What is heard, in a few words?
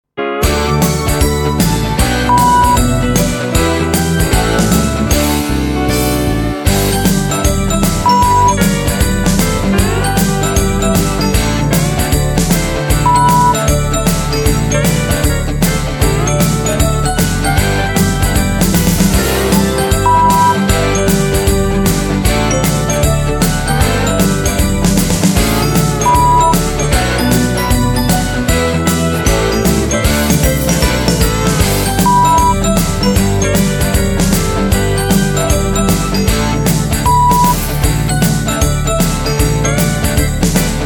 XMas Rock Shuffle